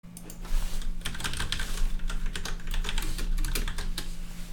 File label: cold outside of 8-bit